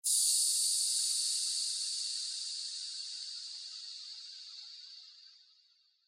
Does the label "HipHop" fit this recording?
No